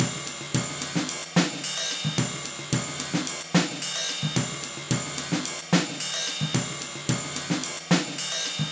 110 live ride jazz drum

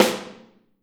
Snare OH; Snare OH All; Drums Percussion

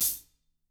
HT EDGE; ILIO; Double Platinum Drums 1; CAMBKIT

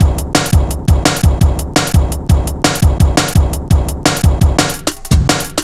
tanked up, Drum Loops, Drum&Bass, 170; Total Drum&Bass

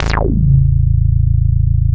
DIGI BASS; DIGI BS

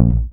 bass 4 (Bb) muted; Sonic Foundry (Sony Creative Software); Ugly Remnants VOL-2; Basstuff

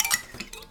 Finger Glitter; Battery 3 Sample Kits

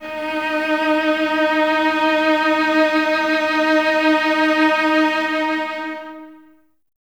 violas D#4, String Ensembles; Miroslav Vitous; VLS 1+2

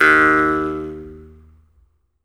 jaw harp; E-MU Producer Series Vol. 7 – Old World Instruments

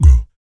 VOC PERC, Vocal Planet Jazz FX BASS PERC

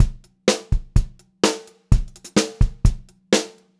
126 PO5, Pop-Rock Drumloops

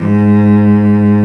CELLO G#2 R, SOLO STRINGS; Greytsounds VOL-1

